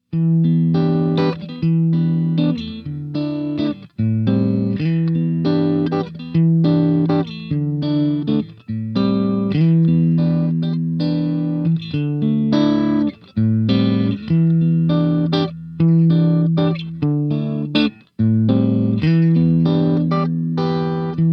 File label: Neck Center Clean